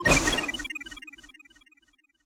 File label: Weapon Magic